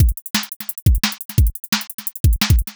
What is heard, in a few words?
fast drum and bass break 174bpm; 2 bars